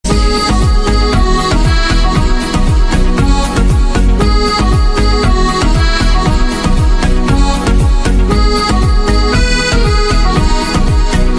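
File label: accordion ringtone; apple iphone x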